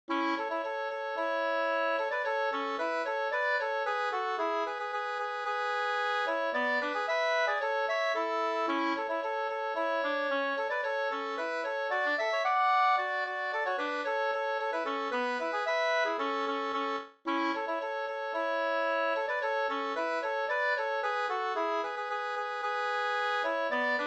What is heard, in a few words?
polka